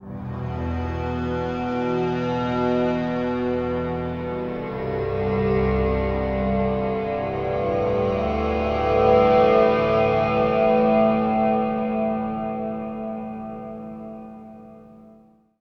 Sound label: Blazing Down, Acoustic Drone, One-Shot Beds; Sonic Foundry (Sony Creative Software) - Friendly Fire; DroneLoop